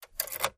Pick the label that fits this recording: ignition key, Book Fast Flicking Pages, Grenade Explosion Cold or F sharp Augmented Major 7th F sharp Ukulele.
ignition key